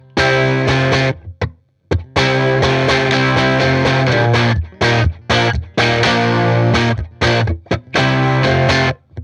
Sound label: 5E3 Clean mid mono, Champ Och Deluxe; 5E3 Clean 6 mid; Nordström Champ Och Deluxe del 2